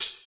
Open Hhat, HiHat; Equipped Music; Loungin House

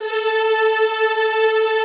A3, Classical Choir; Best Service; Extended Classical Choir

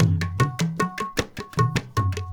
INDIA R, Heart of Asia, IND ENS

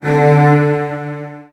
Virtuoso Strings; ILIO; Kirk Hunter; CL 16TH

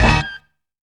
MEAN STAB; Zero-G; Funky Elements

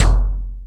Toms 1, drum machine

